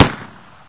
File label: smg1, weapons; hl2